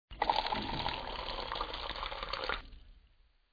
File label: water machine